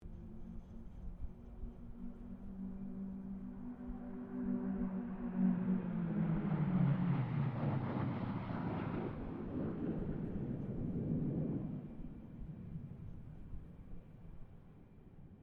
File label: AIRCRAFT GLOSTER METEOR F MK8 PASS DISTANT; Gloster Meteor figter jet; Airplanes (Sound Effects:Vehicles)